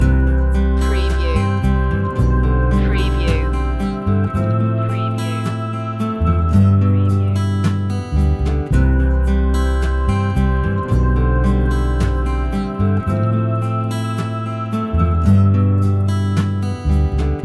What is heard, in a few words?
acoustic ocean loop